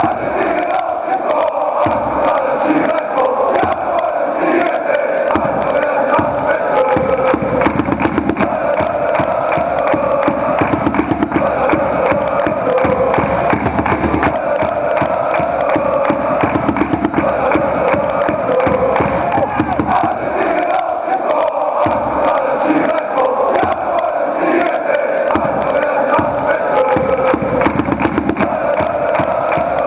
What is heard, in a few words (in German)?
alle singen laut im chor, chants; Our hometown is the Baltic Sea beach